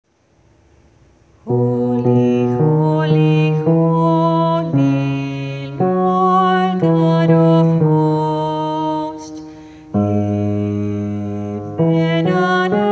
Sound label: bass